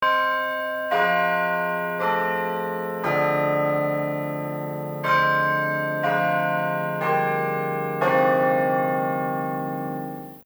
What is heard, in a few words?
amb